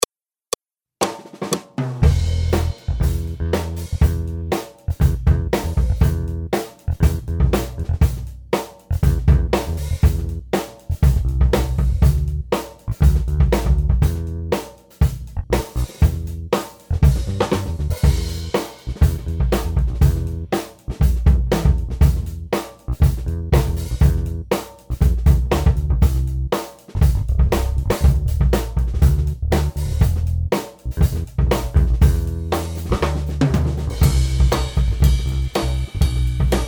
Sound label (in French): Accompagnement seul